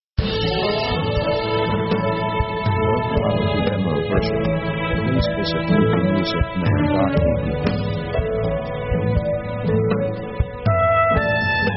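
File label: orchestra euphoric progress; victory themes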